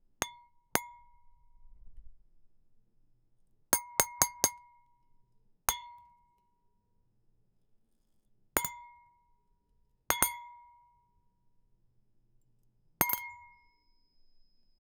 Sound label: a ball falling into a